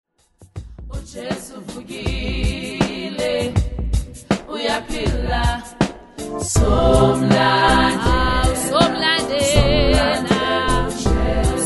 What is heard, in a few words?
Baptist Choir; Maroka; Somlandela